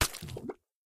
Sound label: Cheese; doodle jump 2; android